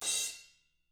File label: REMO KIT; ILIO; Double Platinum Drums 1